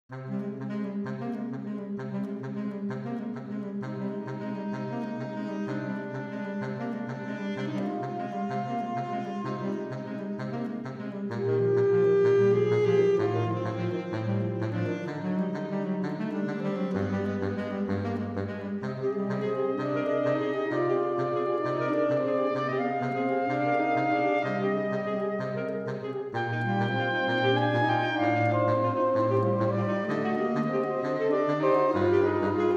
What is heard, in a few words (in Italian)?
quartetto II excerpt